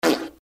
fart short

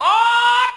"ah", Voice

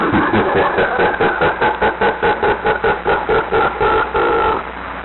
laugh evil

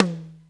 Toms Real

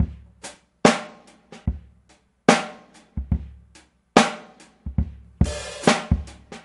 sleeperhold, Drum loops Boombap

